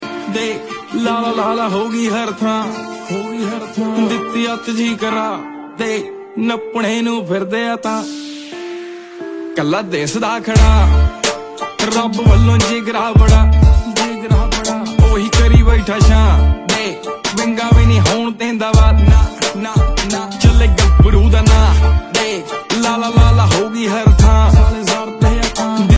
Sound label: Song Ringtone